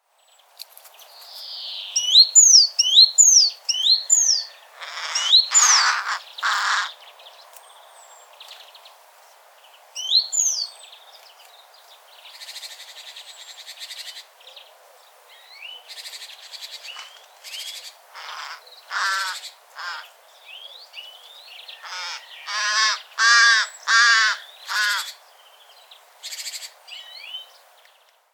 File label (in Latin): Corvus corone; SETE